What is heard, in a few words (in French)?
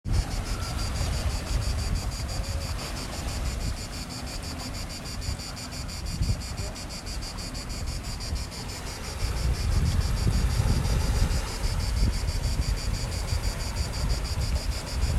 Cigales